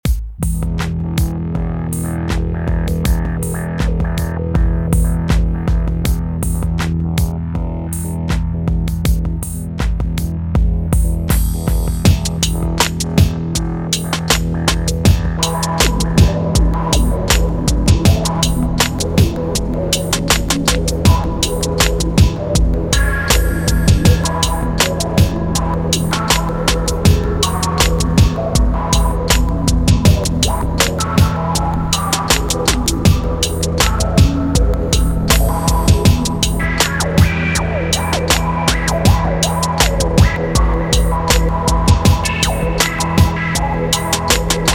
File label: Ambient